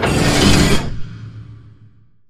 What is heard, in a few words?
sliding door ext verb open slide; Titanfall 2